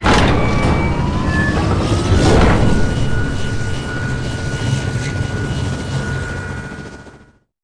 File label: futuristic jet tap; superheroes2